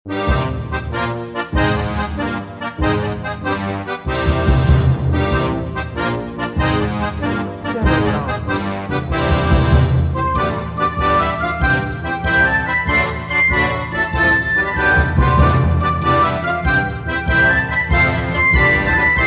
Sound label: Drunken Sailors Tavern